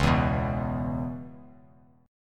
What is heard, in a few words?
Db-Minor 7th-Db-x,4,2,4-down, Dbm7 chord; strummed; JGuitar